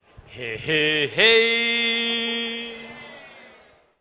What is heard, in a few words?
heheheeeeee